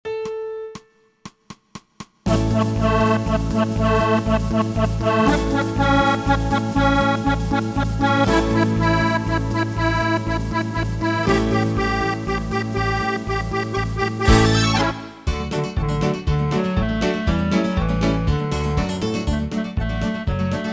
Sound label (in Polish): midi akomp; zielony mosteczek